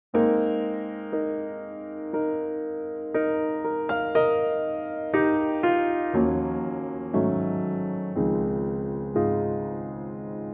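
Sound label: Ab piano, male; key - Ab - vocal range - Eb to C; Pianotrak; movie Bugsy Malone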